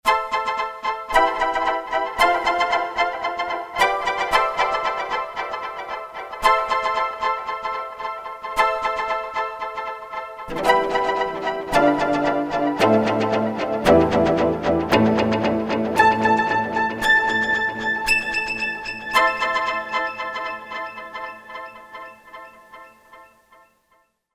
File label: Str Echo, Korg DSS-1; Woodwind & Strng